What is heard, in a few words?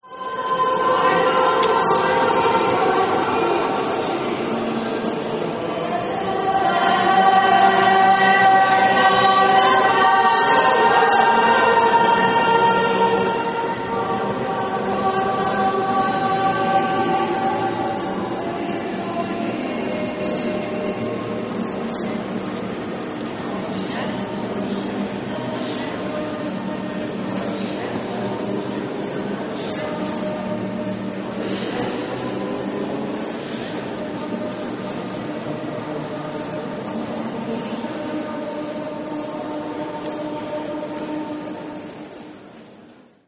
chorus; 4日目 ポストイナ鍾乳洞